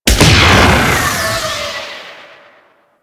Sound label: Sabotage Cell Destroy; Warframe; Missions